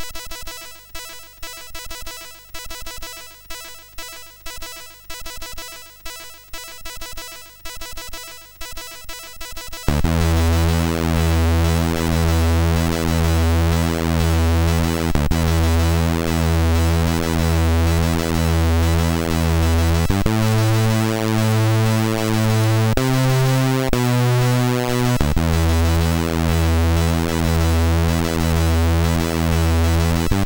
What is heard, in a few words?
In-Game, sid MOS6581R4; Breathless